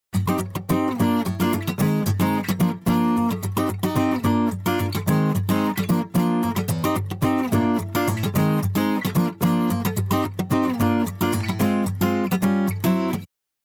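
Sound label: guitar Back Limit On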